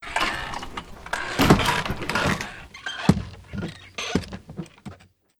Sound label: pub door; DeadFright